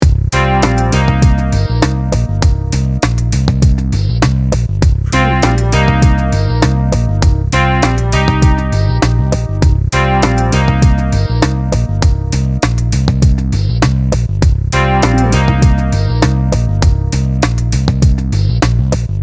hypnotic chill out loop

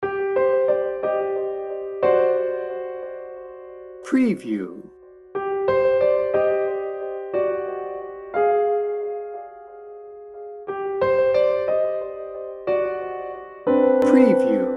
dark shadows

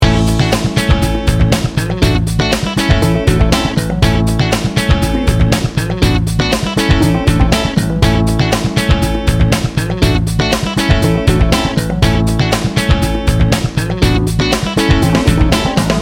its a party loop

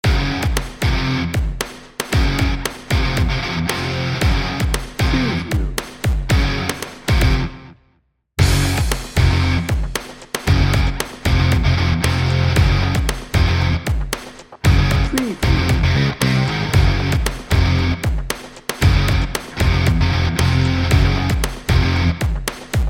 bollywood action hero dnb

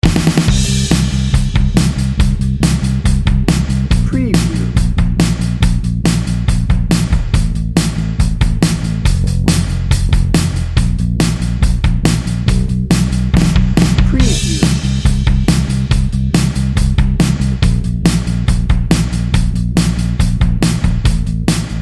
biker ride dnb

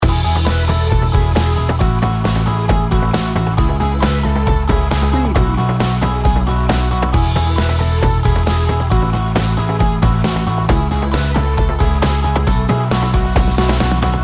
high five loop

